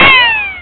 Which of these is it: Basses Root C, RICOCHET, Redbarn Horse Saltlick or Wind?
RICOCHET